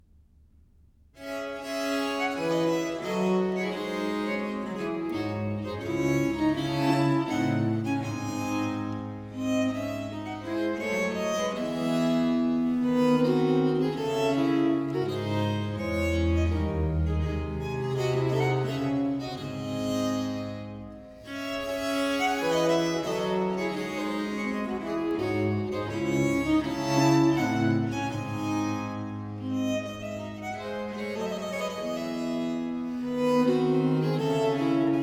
suite no. 1 in four parts, in d major ii. cour, english music for viols